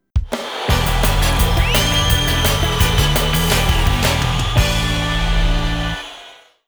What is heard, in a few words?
Race Start; Gran Turismo Japanese Edition; PS1